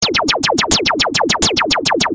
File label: alien machine gun; matt cutillo